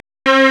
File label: STRNG; CMP - Analogon